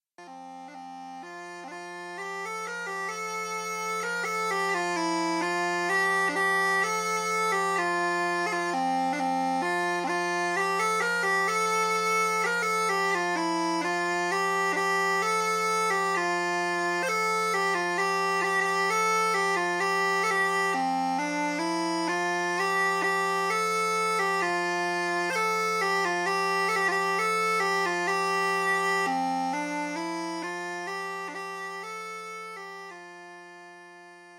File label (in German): Huemmelchen 1 Bordun F